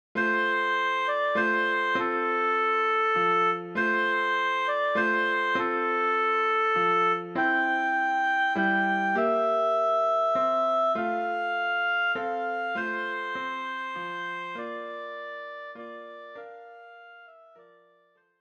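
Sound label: Silent Night, Oboe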